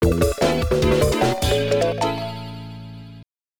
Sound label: Challenging Stage Goal In; Namco Classic Collection Vol. 2; arcade; Namco ND-1